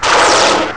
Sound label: Hit Sound; ST-XX Amiga Soundtracker Sample Packs